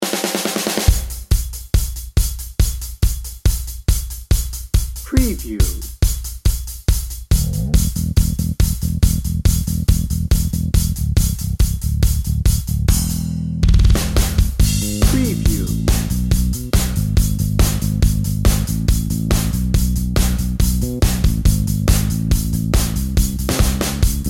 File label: showtime rock dnb